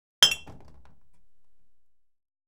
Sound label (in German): glas wird auf glas geschlagen; geraeusche digital